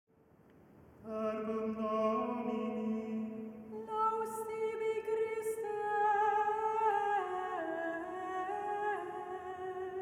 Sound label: response after gospel